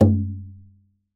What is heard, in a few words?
Rebolo, World Percussion; Cymatics; Emperor World Percussion